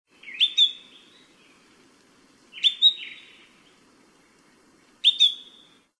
Coccothraustes abeillei

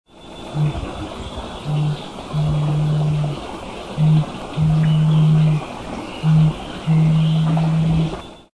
Oreophasis derbianus

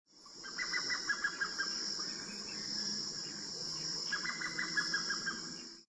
Aramides cajanea

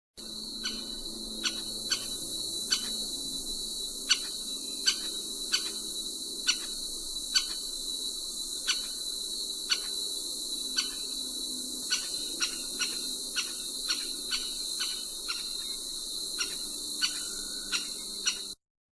Melanerpes aurifrons

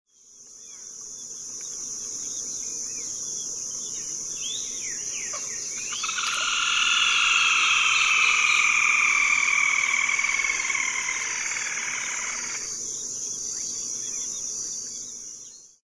Laterallus ruber

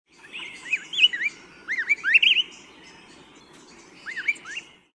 Pheucticus chrysopeplus

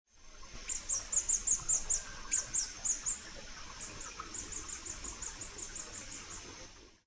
Hylocharis eliciae

